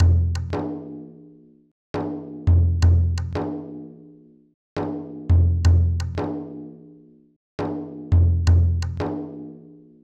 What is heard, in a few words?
Dolak 85 bpm; Loops; Drums; Percussion; Ableton Live 12 Lite; Core Library